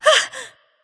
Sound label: surprised; smite; persephone